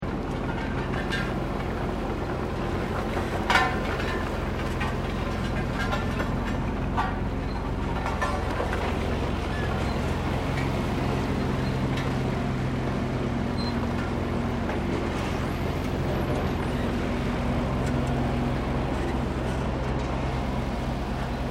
Construction Vehicle Road Roller Operating Loop, Road Construction in Sannohe